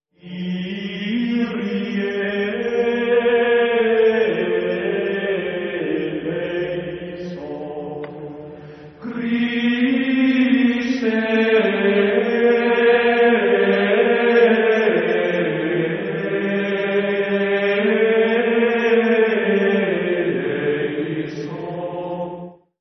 Kyrie Gregorianik

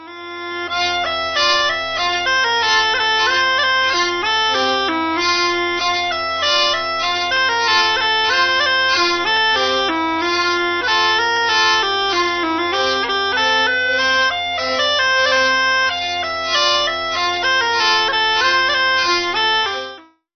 Sackpfeife Trumschei

